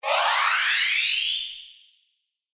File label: Speed Up; GGJ2022